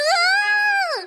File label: screaming